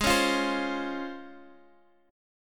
Db Major 7th Ab

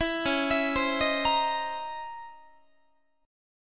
C sharp Minor Major 13th C sharp Guitar; C#mM13 arpeggiated; 19,21,19,22,19,21; JGuitar

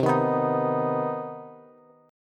C Minor Major 7th C Bass; strummed; JGuitar

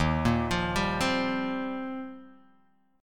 Eb 6th Add 9th, Guitar, Drop D; 1,1,3,0,1,x

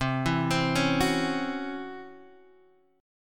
Db Minor Major 7th Double Flat 5th C

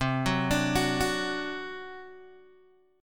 C Suspended 2nd Flat 5th C Guitar Open D; down; chord shape

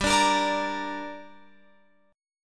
G Minor G down Guitar Open F, chord; Gm strummed

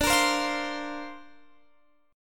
D-Minor 7th Sharp 5th D, chord; Dm7#5 strummed; x,17,20,17,18,20

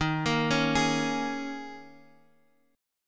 Eb 7th Suspended 4th, Guitar, Half Step Down; Eb7sus4

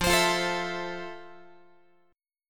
F Major 7th F, Guitar; strummed

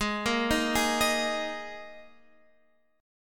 Eb Suspended 4th Ab

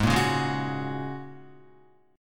G sharp 7th Suspended 2nd Suspended 4th, Guitar; Listen to G#7sus2sus4 strummed

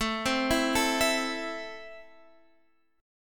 A Minor A Guitar Half Step Down, chord

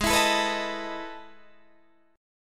E Major 11th chord

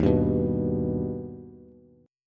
Eb Augmented, Bass; strummed

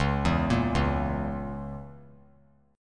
C sharp Minor 6th; C#m6; arpeggiated; JGuitar

